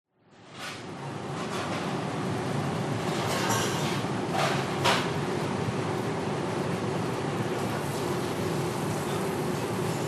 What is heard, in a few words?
restaurant kitchen ambience indian chefs busy cooking fans